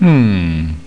HUM

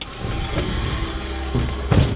move land; incudemo

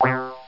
synth; Transcription: / Where?

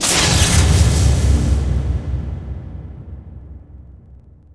soul bang

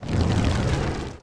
burn; dungeonmaster

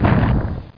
Defense Explode; Triple-A 1.6

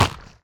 sandstone; Geschicklichkeit; Bricks Of Egypt

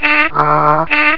Wins; Macworld (1997-11); Games World; Shareware Games; Arcade; Peng! 1.0

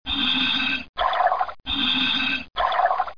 scuba; DR BRAIN; BUGS

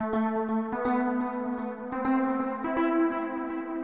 SYNTHPAD, HOUSE, 125, A; eJay; Sphere

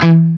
chug E2

